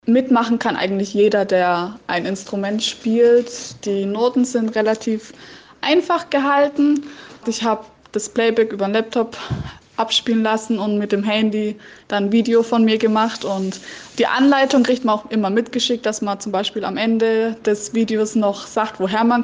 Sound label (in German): Orchester